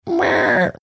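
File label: mimicking pained meowing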